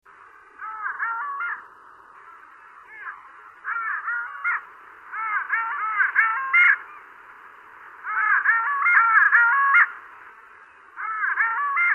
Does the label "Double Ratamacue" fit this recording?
No